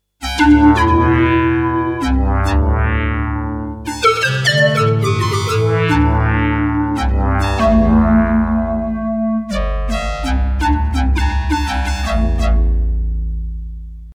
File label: V50 Blow Lead